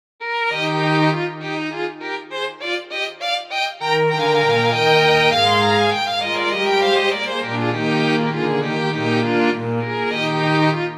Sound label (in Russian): strunnyi kvartet (vse golosa); i.k.f.bah